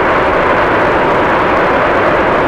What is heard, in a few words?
Rocket; MiSide